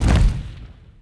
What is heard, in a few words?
stone hit stone lg; objects; Star Wars Obi-Wan; XBOX